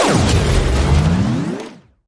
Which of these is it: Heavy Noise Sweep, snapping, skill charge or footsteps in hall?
Heavy Noise Sweep